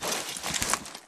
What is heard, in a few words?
wall slide; Titanfall 2